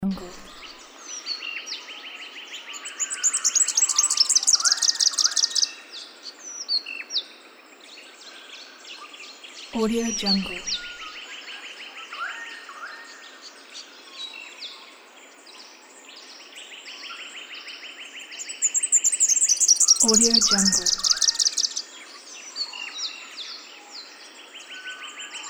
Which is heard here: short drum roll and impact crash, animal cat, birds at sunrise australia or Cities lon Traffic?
birds at sunrise australia